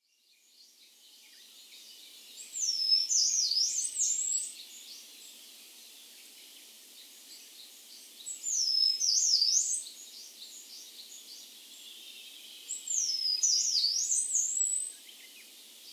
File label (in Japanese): karafutomushi; nagasaki